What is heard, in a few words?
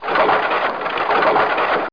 wagon; Master Clips Email Animator